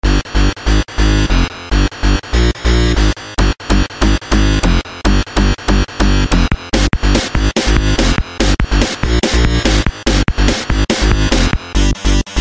ending; vectorman

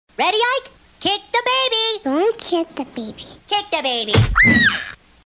kick baby

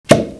plop; Misfire Sven Co-op Chat Sounds

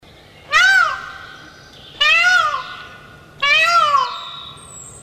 peacock

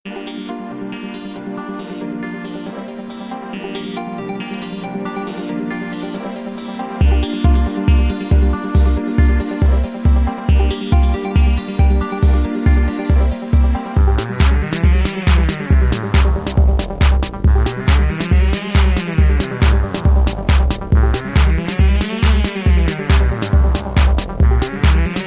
disco train; dkc2

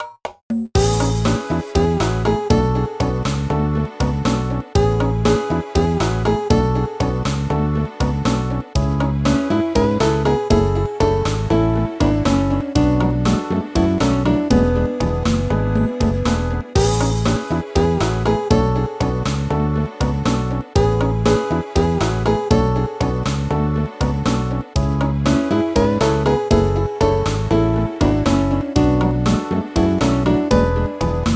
menu

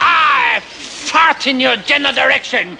fart taunt

